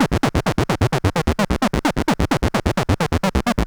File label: Sh101 Loop, 130BPM